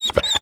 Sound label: injured out, breath; reviver